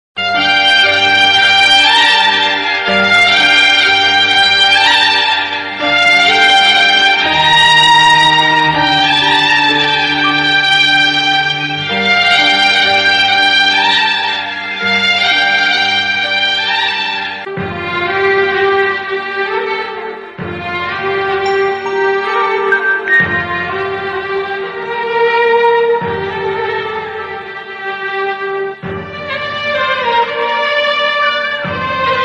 heart touching ringtone emotional ringtones; raja movie; venkatesh

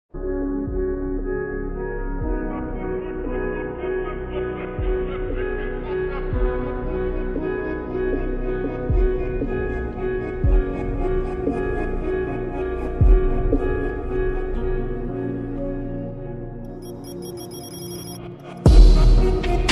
sad instrumental slowed reverb ringtone

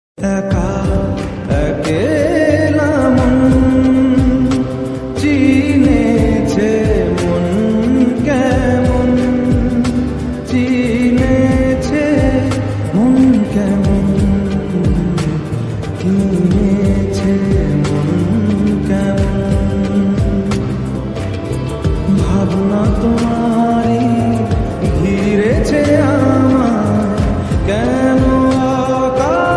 bengali ringtone sad bgm music

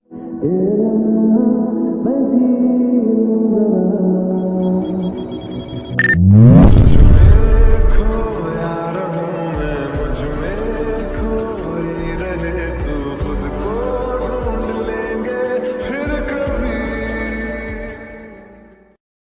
lofi mix song ringtone hindi ringtone

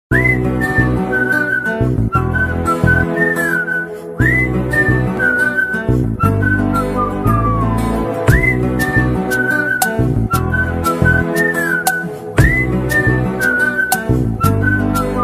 instrumental ringtone whistle ringtone; ala vaikunthapuramuloo

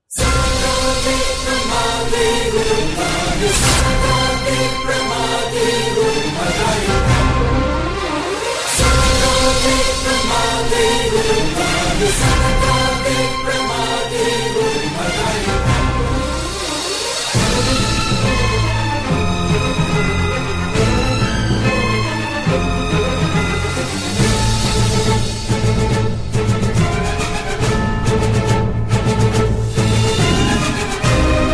bgm ringtone; manmadhan

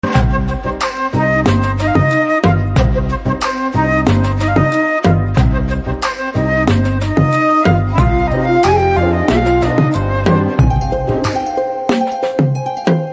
BGM Ringtone; Telugu Private Songs

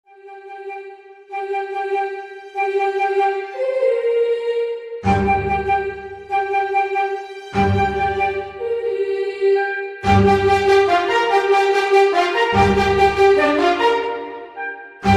bgm ringtone music; virata parvam

